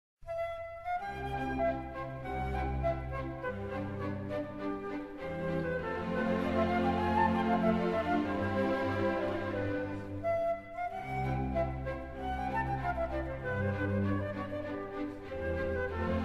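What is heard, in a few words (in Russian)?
arija, aria; kuklovod; kak zvonok tvoj volshebnyj zvuk; arija Tamino iz Volshebnoj flejty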